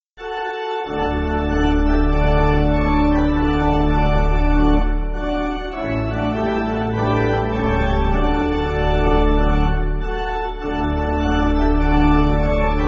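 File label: Pipe, Pipe Organ, Music Backing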